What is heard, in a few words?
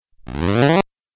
Rising Video Game